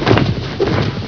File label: WING FLAP, ANIMALS